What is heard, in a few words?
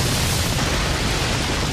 Explosion